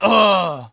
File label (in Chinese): 男 受伤; renlei